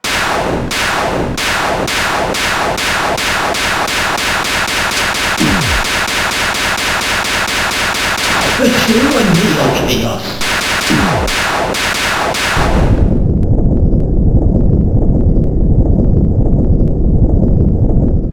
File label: final chaos; jevil